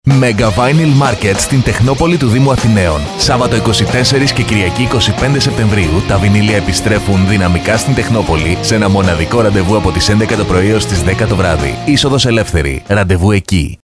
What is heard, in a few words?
rock